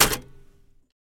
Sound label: Typewriter Back Spa; typewriters